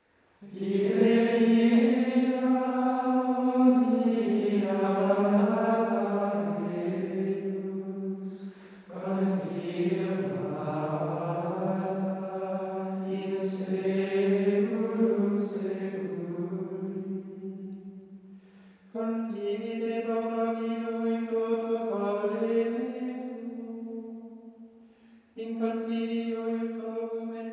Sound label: Antiphon Psalm 110; Vespers Compline